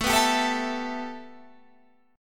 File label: A 7th Suspended 2nd A, Guitar Standard; strummed